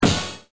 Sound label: hit